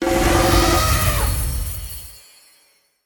big win mega win; serengeti kings

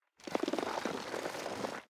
reel spin start; goldentavern

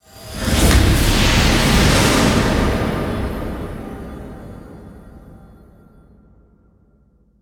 big Win Start; goldengrimoire

